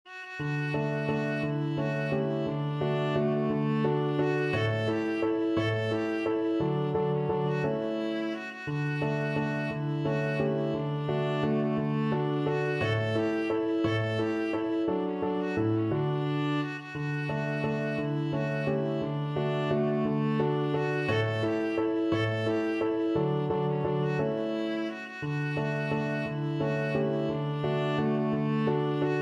german dance, viola; schubert

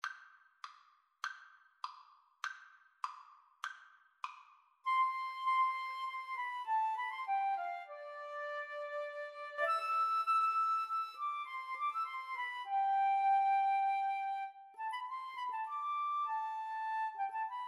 Flute duet

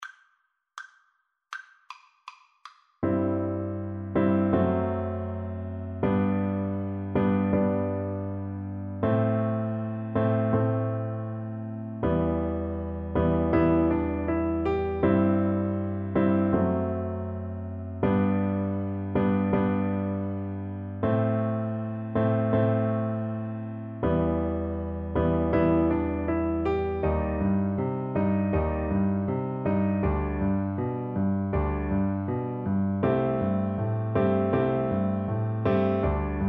piano duet

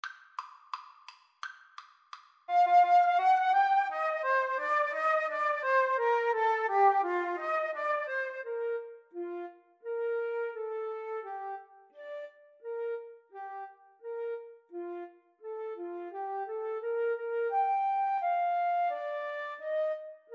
flute duet

